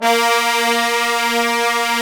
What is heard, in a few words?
BRS TBNS, Bone Sec ff; Roland L-CD702; Bone Sec.FX